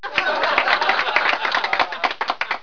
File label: laugh